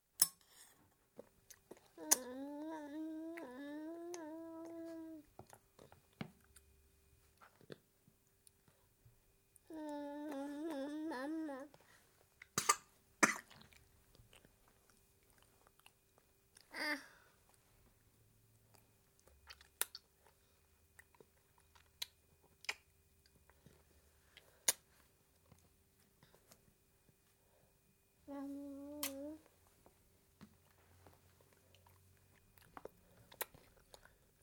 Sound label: 12 month old baby boy, eating with plate noises; Babies; BBC